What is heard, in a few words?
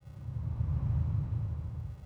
deep rumble; ambience; Soldier Of Fortune II Double Helix; XBOX; kamchatka